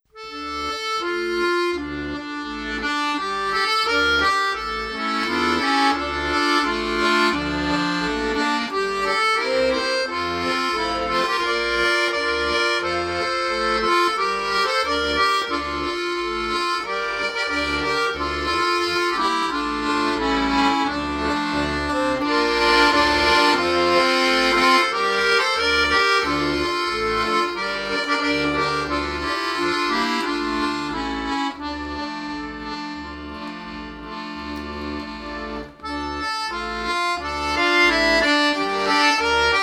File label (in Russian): музыка